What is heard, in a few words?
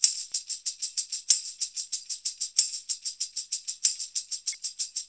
Tamb; Soundscan vol.56; Modern Percussion Loops; AKAI